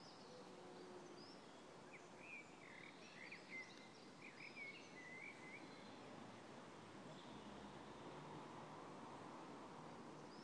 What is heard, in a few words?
peace quiet handforth railway station